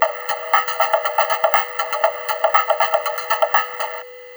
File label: RYTHM 120, Drum Loops Crazy Processed; USB Soundscan vol.07; AKAI